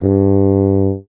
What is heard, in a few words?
Tuba G3; DirectX SDK; DMusProd